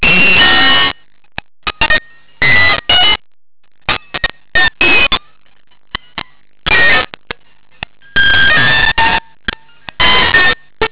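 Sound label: guitar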